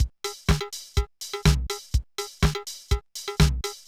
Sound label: Loop, mxr; classic machines